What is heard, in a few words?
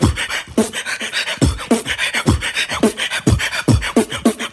lots a breathing, Beatbox, Drum loops